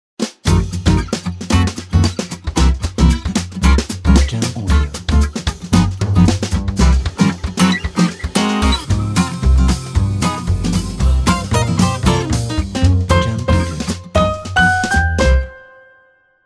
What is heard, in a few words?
Jazz Logo